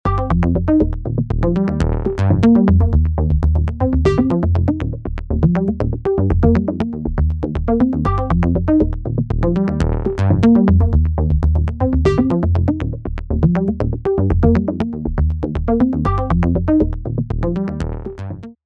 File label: Modular Synth Sequence MS Stereo Spread